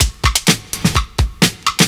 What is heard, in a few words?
126 PERCS; 70 s Breakbeats